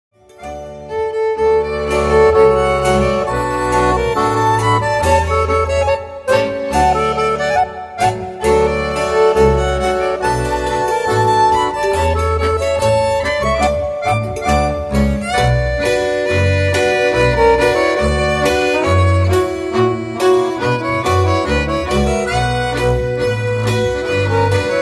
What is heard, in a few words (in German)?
Polka; Sedan